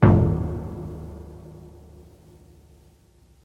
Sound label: classic mid tome drum sample d sharp key